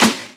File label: Snares; Vengeance - Essential Clubsounds Vol.4